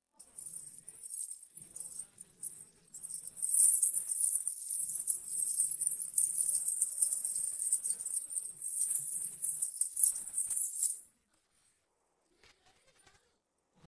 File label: happy no vocals